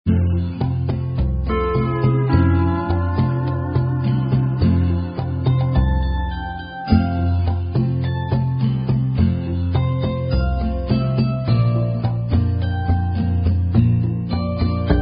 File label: Pokarekare [Instrumental]; Girl Scouts